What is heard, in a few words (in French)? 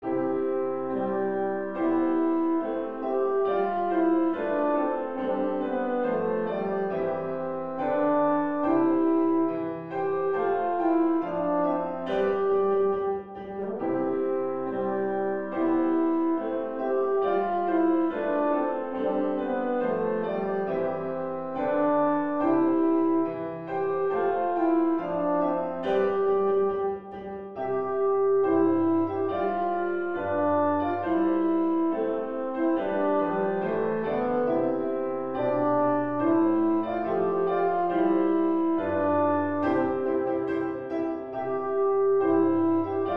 hymne national espagnol